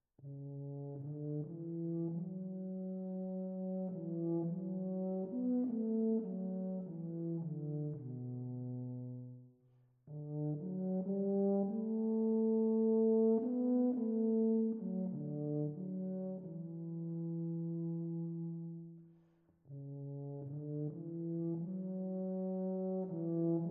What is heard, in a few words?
Tuba Trio